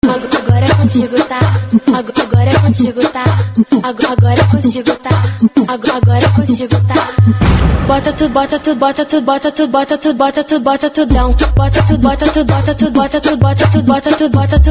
funk

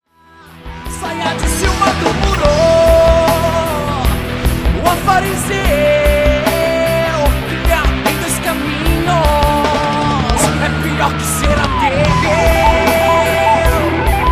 solo

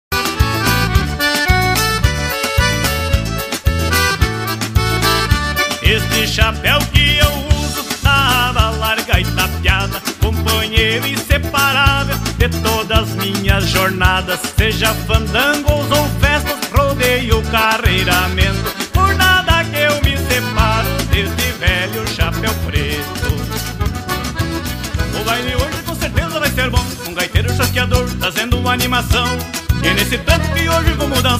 pot pourri do gaiteiro

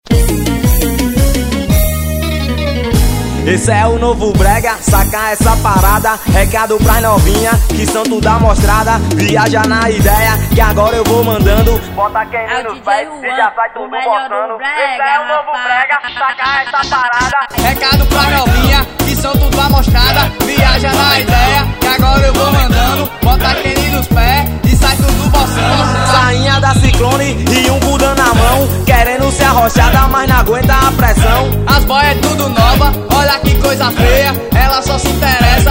brega

